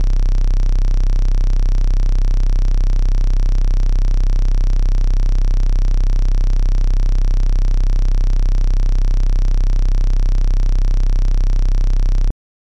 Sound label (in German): Behringer K2 Tuning nach 2h